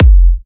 Kicks F; markus hakala

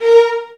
VIOLINS, Synclavier Strings; ILIO

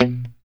POP B 2, STRAT guitar; Spectrasonics; Hans Zimmer Guitars VOL-1; JC-STRAT POP

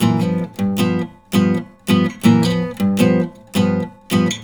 riffs, Blues Guitar; ILIO; Fretworks

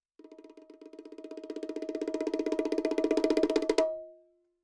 roll, Roland MV8800 percussion

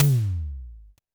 TD-20 Tom B, Roland TD-20 Toms

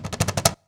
CAJ FILL, cajon fill; Univers Sons - Raricussions

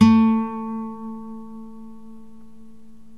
NYLON GUITAR

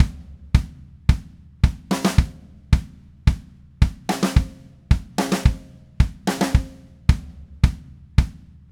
Red Maple Groove 110, DW Red Maple Kit; 110 BPM; Sonic Foundry (Sony Creative Software); Vital Drums

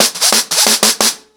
FX 90 BPM, acoustic drums; The Roots Drumloops

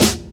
Snare, Percussion Hits; Zero-G Jungle Warfare 1, 2 & 3; Drum Loop Hits

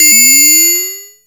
ODD RM BELL; Zero-G Ambient 1; ANABELL PERC

